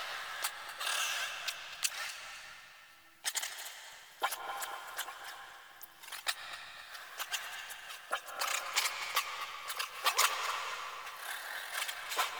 Lair Chatter Loop Large

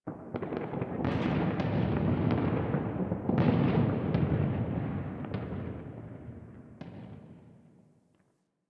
Ambient Distant Explosions; Warframe; NineteenNinetyNine

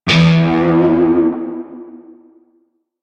Shawzin Flourish Oct One E; Music; Warframe; Narmer Shawzin

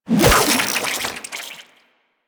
Infested Fishing Spear Line Retract; Warframe

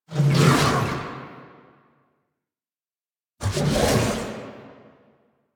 Pass By; Sentients; Warframe; The New War; Grineer Eidolon

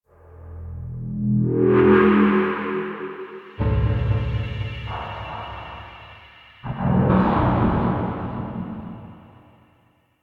Railjack exterior transient wreckage; Ambience; Warframe

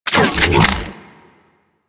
Briefcase Katana Unequip; Crp Briefcase Katana

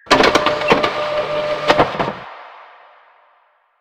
Office Fax Machine Misc; Warframe; Ambience; Nineteen Ninety Nine

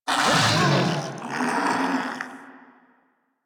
Kubrow Armored Vocal Death; Enemies; Warframe

